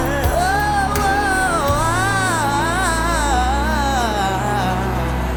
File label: Run 24 notes woh oh i i